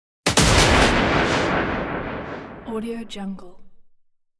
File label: impact fx